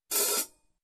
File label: Open Hi Hat